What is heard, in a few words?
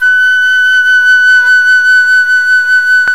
Flute; CMI